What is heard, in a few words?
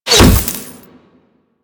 Glass Unbreakable Impact; Warframe